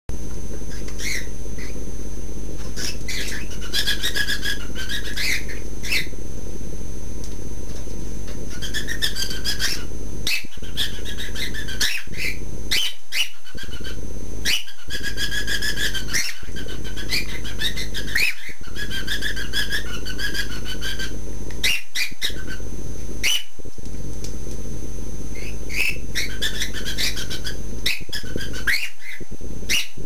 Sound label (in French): calli chante, calopsittes